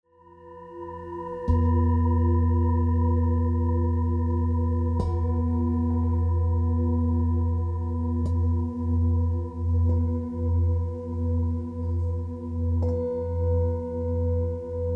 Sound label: mantra; die sonne; platon